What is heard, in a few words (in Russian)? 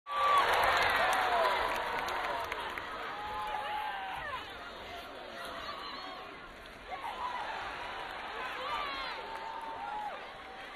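хоккей овации шум толпы разговоры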